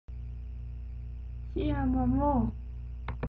lalala

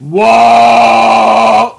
hitsound

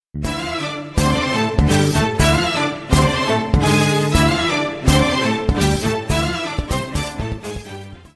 battle; persona 5

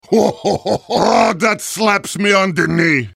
heavy laugh long

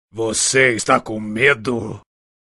fiddle

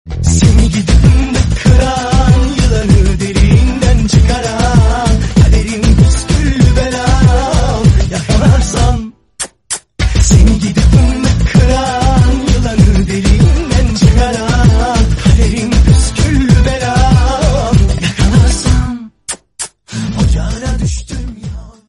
türkiye hymne

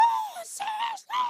aww

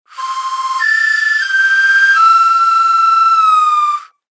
songbird call

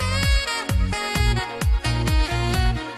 epic sax climax

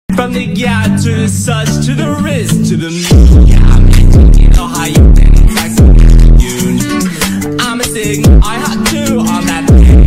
bass boosted

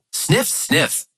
sniff sniff

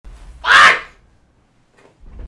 bwaak

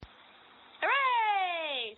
hurray

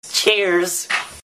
cheersss

